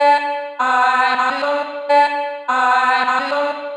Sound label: chopped vocals female Bm 126, reverb